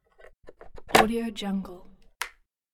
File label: pulling plug from wall socket